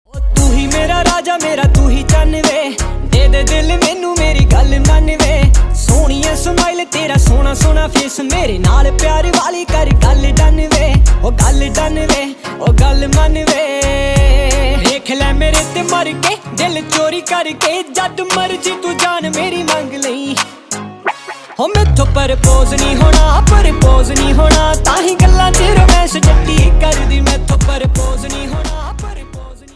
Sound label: Dance Punjabi Ringtone